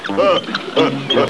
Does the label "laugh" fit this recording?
Yes